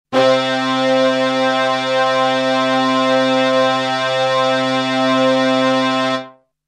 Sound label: Brass; Winners Brass 1.0; Dystopia: synth patches Korg Prologue